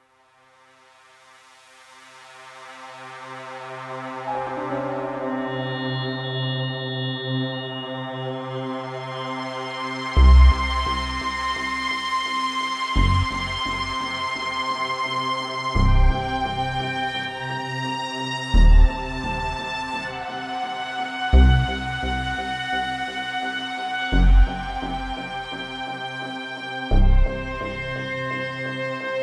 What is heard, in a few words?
Suspense Modern; Base Productions